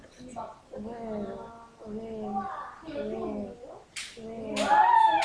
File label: wheel